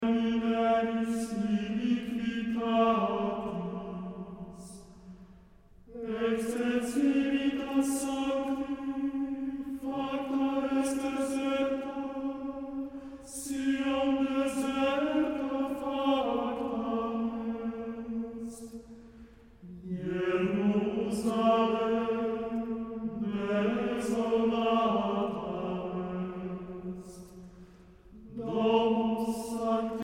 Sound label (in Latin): Rorate caeli